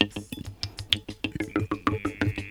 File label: KHOMUS